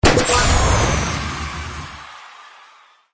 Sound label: dragon tiger win